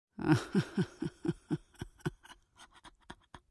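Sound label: AMB China 3D Ambience; gamerip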